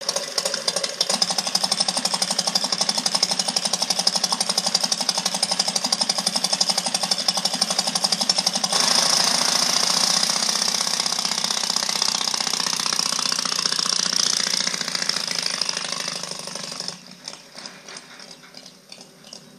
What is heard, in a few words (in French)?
moteur